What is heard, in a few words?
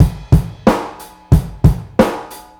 90bpm, Hip-Hop Street Beats; Sonic Foundry; Hip-Hop Street Beats Construction Kit; Street Beats by Poogie Bell